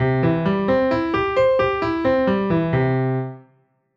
piano clean

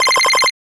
8bit

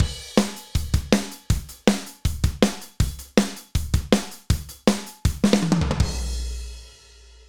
toms

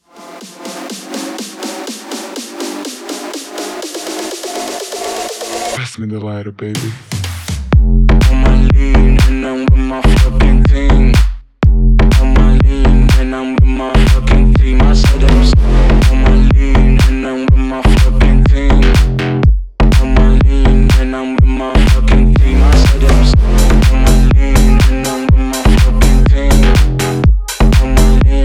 Brazilian Bass

